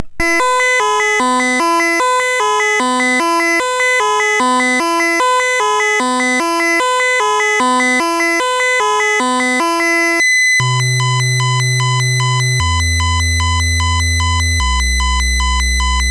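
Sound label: Mad Planets, MOS 6581 SID chip music, games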